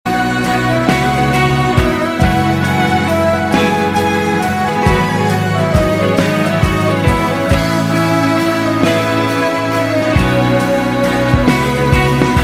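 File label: Love background music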